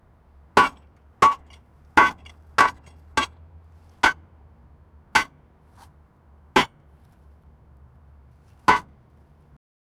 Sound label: shovel dig on asphalt; digging